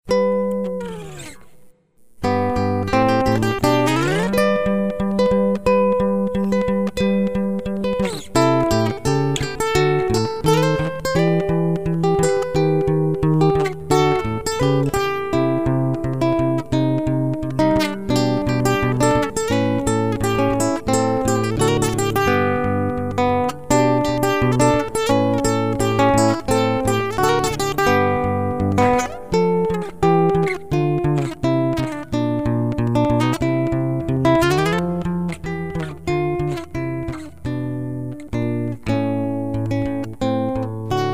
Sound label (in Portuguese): violão base solo